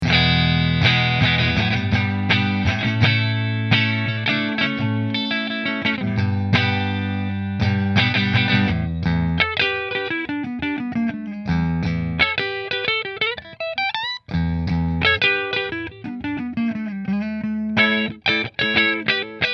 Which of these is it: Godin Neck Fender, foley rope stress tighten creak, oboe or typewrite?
Godin Neck Fender